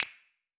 Finger Click; d4dj groovy mix; android

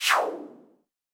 Result OK; Pokémon Friends; Android; Switch 2

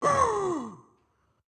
Pain Crazy; Caffiend Caelan

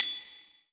Keep Lock; disney star smash android ios mobile gamerip 2020

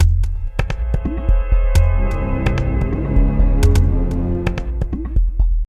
Suspense; Ben 10 Protector of Earth; Wii

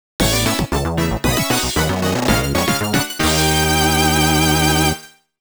Mission Success - Multiple Star Rating; Mario Kart DS

